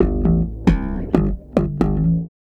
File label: Bass FX